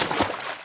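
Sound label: fish splash; Crash Bandicoot The Wrath Of Cortex; XBOX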